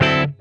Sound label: D9 CHOP, Funk Guitar; Zero G - Funk Guitar